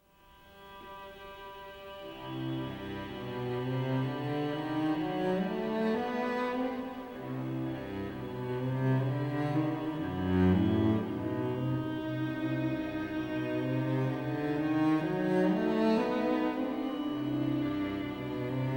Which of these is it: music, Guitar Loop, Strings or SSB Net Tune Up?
Strings